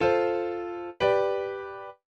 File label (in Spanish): Semicadencia en Dominante